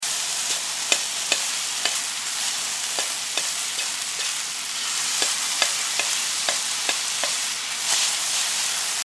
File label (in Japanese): 焼きそば を 焼く